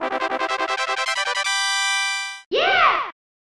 Puzzle Mode Fanfare

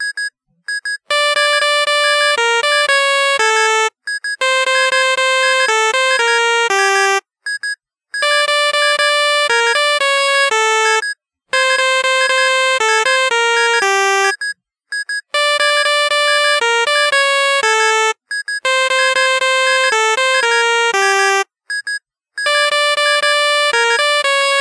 Game Boy Horror Ringtone

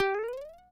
Super Mario Jump Mid, Sound effect; SMBW

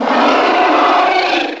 Bowser Roar, One of Bowser's voice clips; MK64

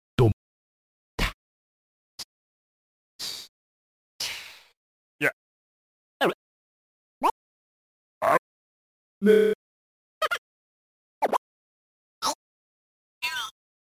beat Box Drums Instruments

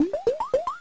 Reserve Item; SMA2

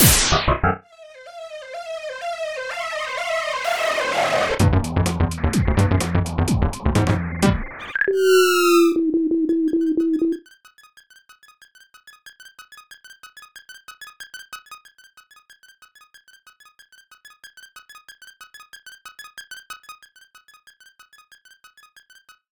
Game Over; Dr Mario

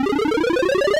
Vine; NSMBW

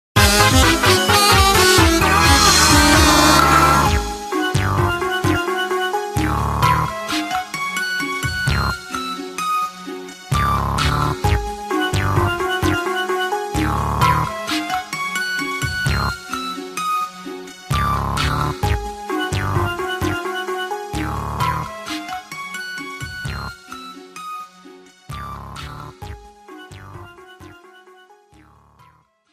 "So Sad to Lose" theme; MP8

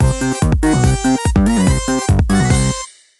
Clearing a level; MM&FaC; Air Tumble Rumble

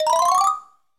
Item Pop, Sound effect of Item Sprout; SMG

